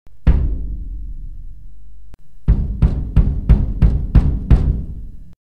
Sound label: Bass drum sound effect